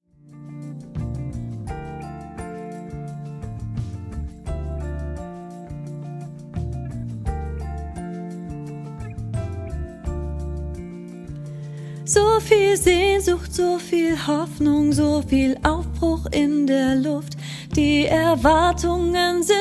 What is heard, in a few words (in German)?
Lern-Sopran1 und Solo